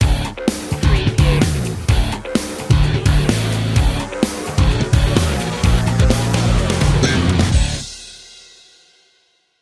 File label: break it down stinger